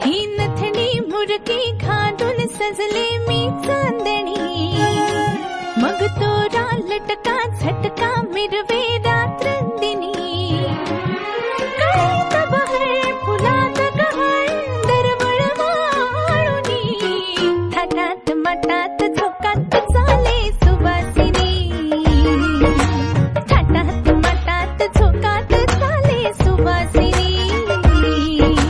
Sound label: Marathi Ringtone